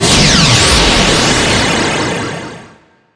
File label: speed application